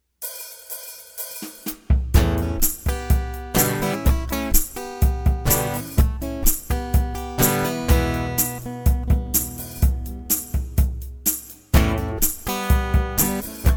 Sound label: Interlude